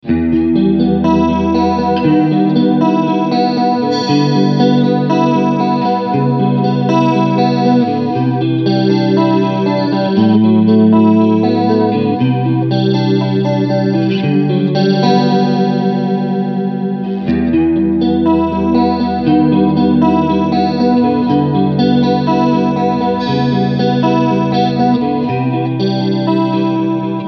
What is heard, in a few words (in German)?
Chorus Vibe Rate 3 Uhr